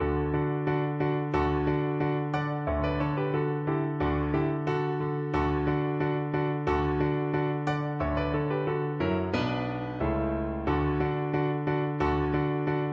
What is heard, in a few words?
theme PNO